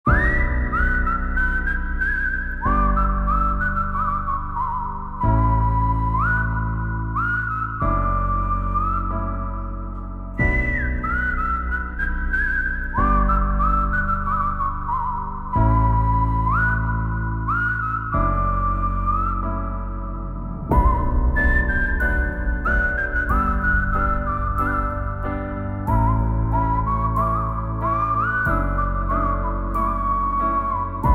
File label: Whistle Emotional